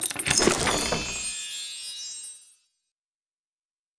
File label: Chest Coins Opening